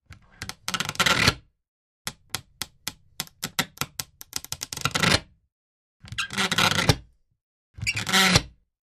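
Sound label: Door Furniture Old Open, hinges creaks; Door Furniture Old, Open,Close Slow x4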